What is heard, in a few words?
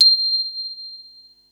mallets